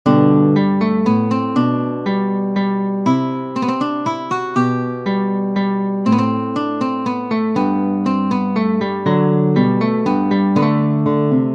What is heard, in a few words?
Menuet in G major, classical guitar; Christian Petzold; BWV Anhang 114